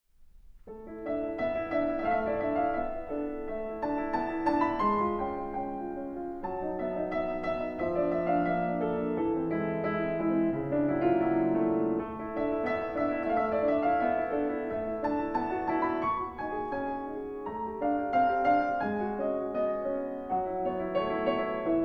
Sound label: Prelude, Solo Piano